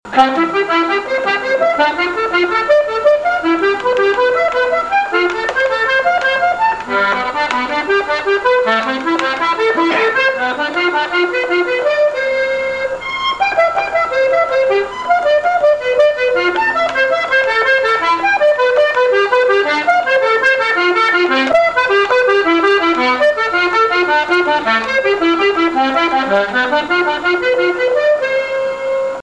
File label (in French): gammes montee